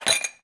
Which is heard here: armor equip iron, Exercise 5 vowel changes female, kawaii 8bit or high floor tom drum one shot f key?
armor equip iron